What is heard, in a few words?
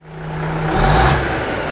lkw start; Manus Civil Package